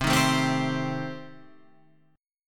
C Minor 6th, chord

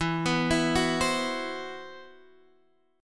C Major 7th Flat 5th E; CM7b5/E arpeggiated

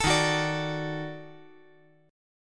D sharp Minor Major 7th Flat 5th D sharp; strummed

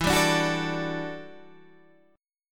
E Minor 11th E down Guitar Standard; Em11 strummed; JGuitar

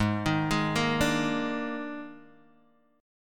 D sharp Major 7th Suspended 4th G sharp

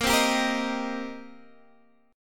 Eb 6th Bb, guitar

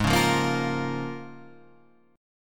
Bb Minor Ab; Bbm/Ab; strummed; JGuitar

